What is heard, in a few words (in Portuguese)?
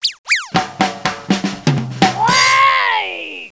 Avião; GEOGRAFIA